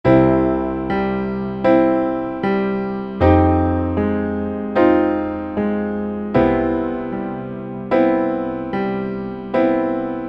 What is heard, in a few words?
PIANOS and KEYBOARDS Grand Piano; Apple GarageBand 2